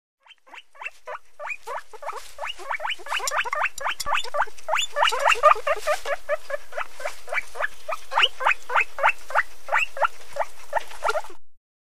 Guinea Pig Squeak. Two Guinea Pigs Squeak Steadily, With Grassy Movement And Clinks In Background. Close Perspective.